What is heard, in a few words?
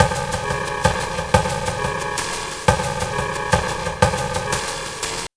Drum Fx Loop, Electronica; Sonic Foundry (Sony Creative Software) - Electronic Point Blank